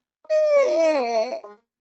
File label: yawn